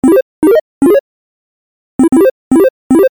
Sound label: 8-bit love